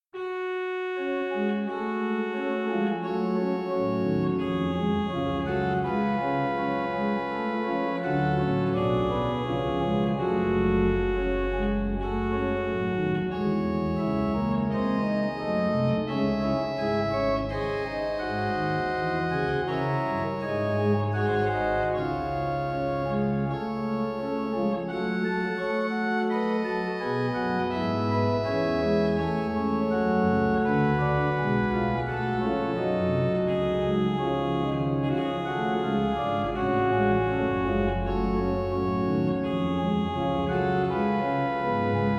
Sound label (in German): Orgelbuechlein; BWV 640; In dich hab ich gehoffet Herr